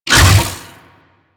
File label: Hammer Shield Whoosh B; Crp Hammer Shield